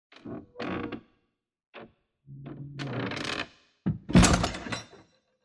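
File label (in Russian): скрип и закрывание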